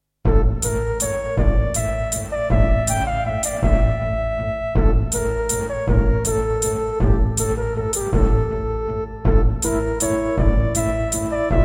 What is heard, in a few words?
Yamaha TG100